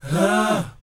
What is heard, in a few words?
BOYZ, Vocal, R&B Dance